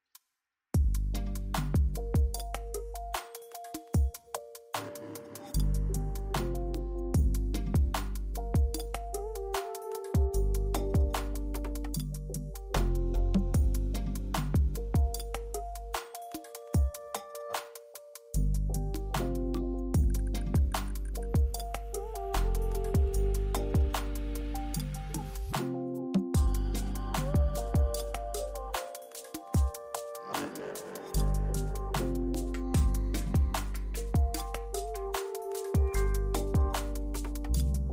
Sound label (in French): musique reparation; La Fabrik